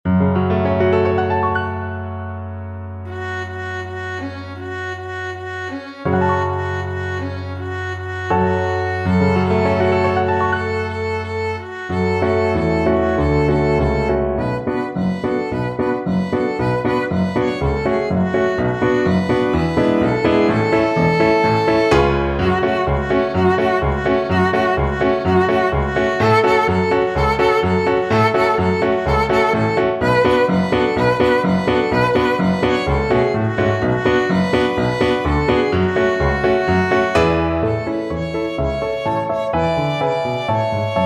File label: siman tov violin